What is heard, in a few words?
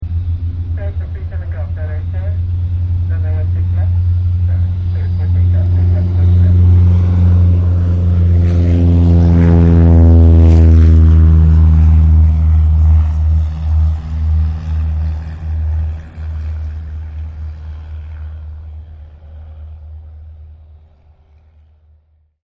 Plane flyby, Plane Flyby With Traffic Control Giving Direction; Airplanes (Sound Effects:Vehicles)